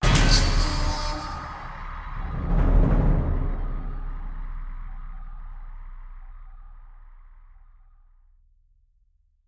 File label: Stinger Jump Scare; The Park